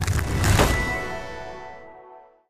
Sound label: wild animation; elements